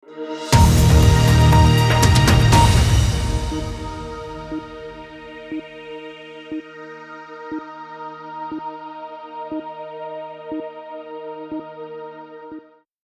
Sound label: BBC News Channel Network Ident with hold